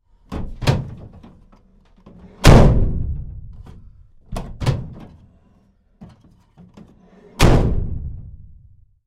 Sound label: Van Door - Open and close x2 - panel van; Metal Doors; Tom Hutchings